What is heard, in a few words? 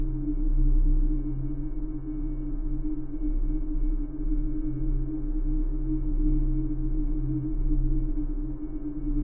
drone, ambient drones; Left 4 Dead 2; Source Engine